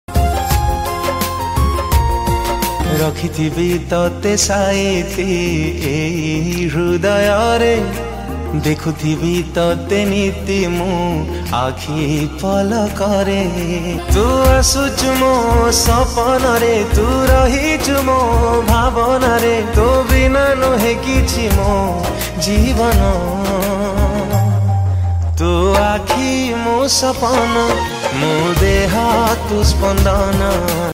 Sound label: Odia Romantic Song